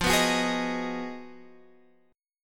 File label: B 6th F, guitar chord; B6/F; strummed